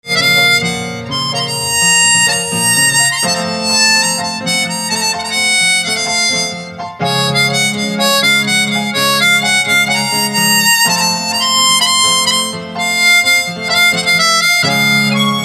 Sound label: Pianica